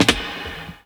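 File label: snare roll, Ragga & Dub Reggae; USB Soundscan vol.27; AKAI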